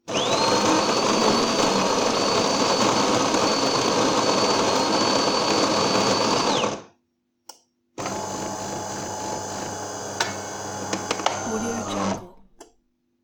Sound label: coffee grinder serving espresso off